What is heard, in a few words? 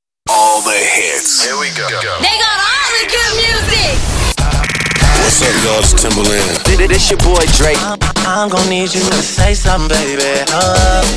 beatmix; dancemixusa